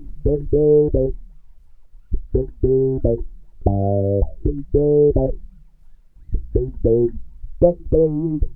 BASS 114 C, Bass Grooves; USB Soundscan vol.30; AKAI

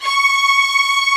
HI ST STRING

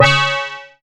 C#, BELL GONG, Synclav FM; Big Fish Audio

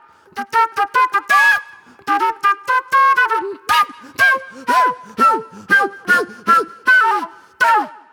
Old Soul Flute

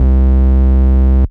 BASS Glom, bass; M8; Electronisounds

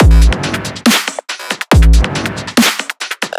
140 drum loops; ThunderBoi; M8; Electronisounds

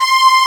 Piano, POP PIANO; AKAI S6000 CD-ROM - Volume 1

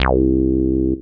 303 Bass, Old Skool House; Big Fish Audio; OSH Bass

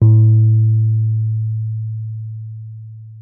WURLITZER, A2; Xsample VOL-1; 90 s Sample CDs; WURLITZER RS

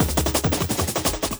Beat, Industrial

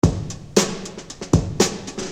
Beat, Hip-Hop Street Beats

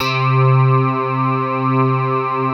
basses; Wizoo - Powered Wave

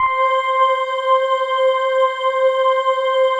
PAD, DIGI-PADS

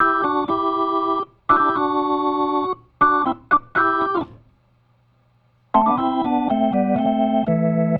Organ, 70s Analog Funk; Sonic Foundry (Sony Creative Software); What it Is! 70s Analog Funk

